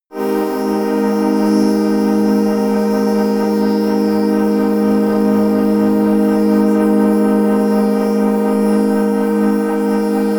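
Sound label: chill air, pads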